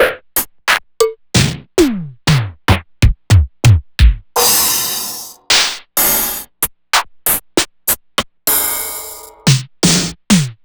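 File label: Roland MV8800 drum DIGITAL KIT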